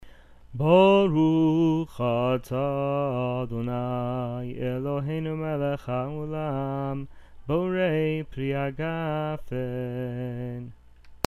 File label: sheva brachot borei pri hagafen; rabbi